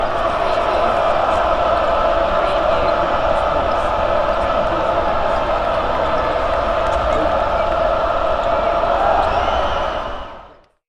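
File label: Crowd Jeering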